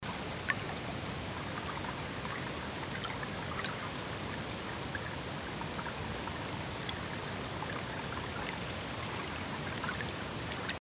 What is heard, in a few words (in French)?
Defaut sonore souffle hiss